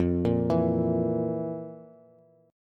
F Major 7th Suspended 4th, Bass; arpeggiated; x,8,8,9; JGuitar

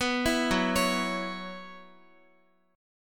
Gb 7th Suspended 4th Gb down Guitar, chord; Gb7sus4 arpeggiated

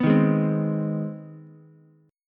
C Minor Major 7th Sharp 5th chord, Ukulele; strummed; JGuitar

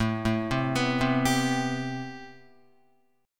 A-Minor 9th A Guitar; arpeggiated; JGuitar

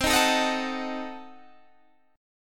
C Diminished 7th C down Guitar Open G

